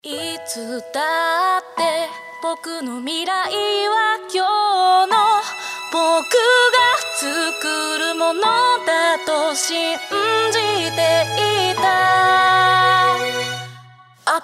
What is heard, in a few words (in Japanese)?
女性楽曲サンプル